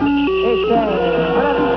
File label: win; italy